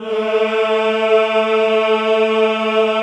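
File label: Choir A2, Ehh; Eeh + Ehh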